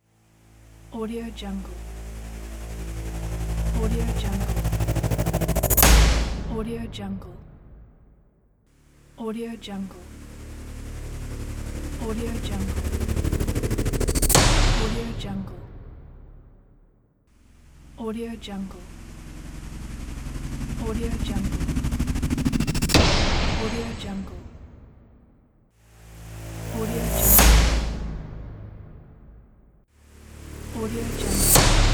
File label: rotation impact fx